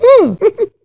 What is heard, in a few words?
hunter pounce miss; huggy wuggy snpc; elmo